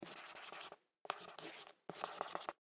Dry Erase Marker Write On White Board Short; Office; Erasers

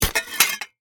Grapple Impact, Grapple, Impact, Concrete; Warfare; Miscellaneous Combat; BlastWave

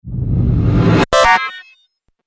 Trailer Hit Videogame; Trailer Sound Effects

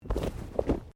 Body movement Clothing Foley

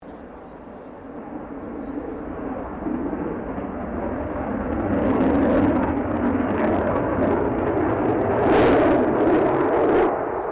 AIRCRAFT FA-18 HORNET PASS

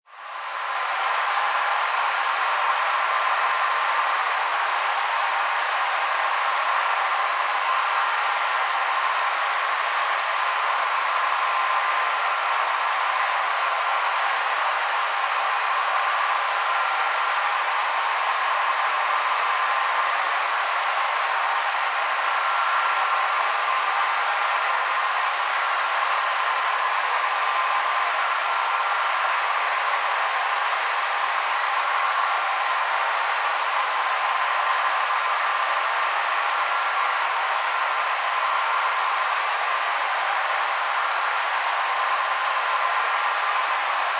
Sports Stadium Crowd Cheer Applause Long Distant; Crowds; Lynne Music

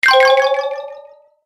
Win Bonus With Delay, video game sound effects; Twistedtracks

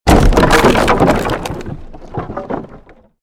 created wooden structure crash medium; Series of dramatic events based on wooden smashes and collapses; Crash Sound Effects

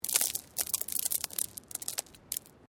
Stone Small Move

